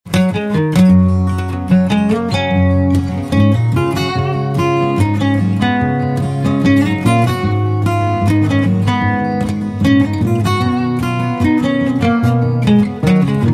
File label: Dream Guitar